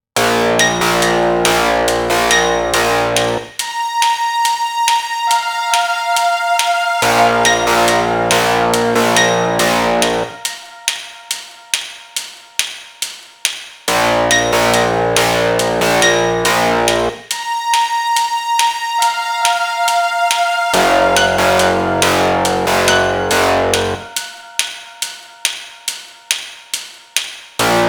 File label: Theme Loop Stem